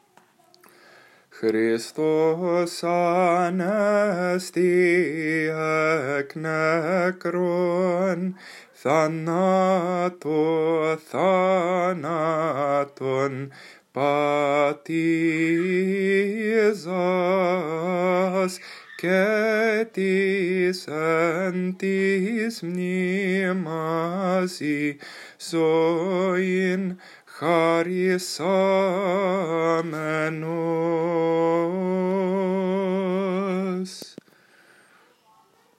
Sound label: Christ is Risen Greek Byzantine